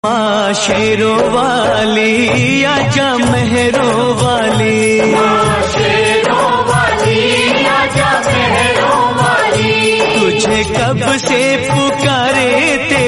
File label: hindi; maa vaishno devi